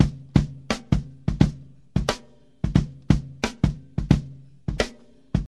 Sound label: 88 bpm rock breakbeat g key; drum loop